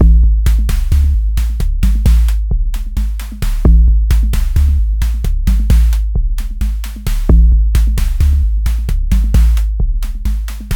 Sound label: Sine Wave, Processed Percussion